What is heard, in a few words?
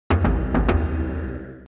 sound barracks; commandos